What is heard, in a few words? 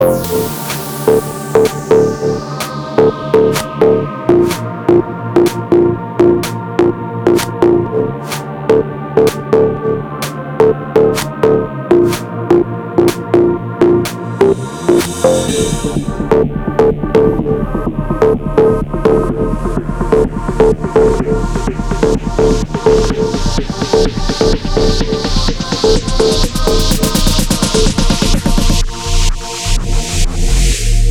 Fall With Me Deep House Dub